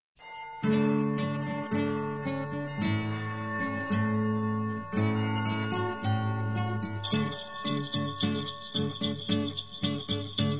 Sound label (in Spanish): Villancicos Populares, Campana Sobre Campana